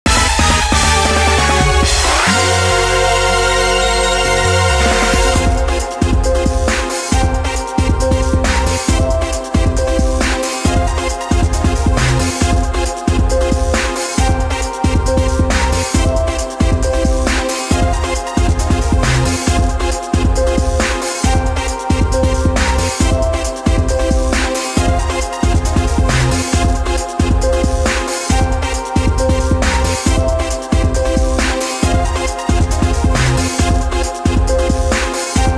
Lose Jingle; Busou Shinki Battle Masters; PSP

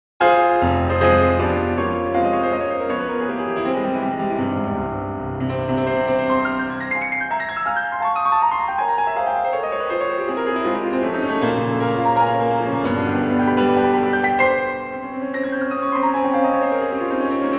piano miss concert

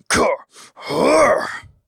Grunt Double; gamerip; Brumm

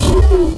Glyptodont Attack

